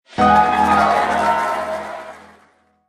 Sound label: jingle win; pool8ballmania